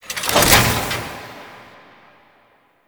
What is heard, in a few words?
Foundry Build Mech Up; Interior; Ambience; Warframe; Nineteen Ninety Nine; Tailor Shop